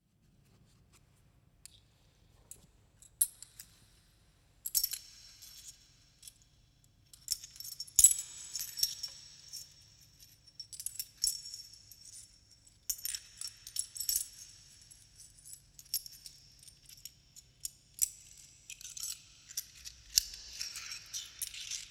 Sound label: Improvisation, Playing the Piano